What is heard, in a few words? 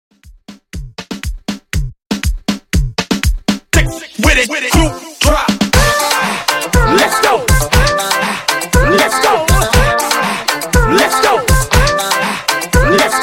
Hype Reggaeton 120Bpm